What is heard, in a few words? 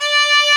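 viola and violin, string quartet; 90s Sample CDs; Club-50 - Foundations Roland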